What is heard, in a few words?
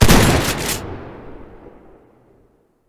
shotgun dbl fire; weapons; npc